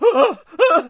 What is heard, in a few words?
Scared Male; Friday the 13th: Killer Puzzle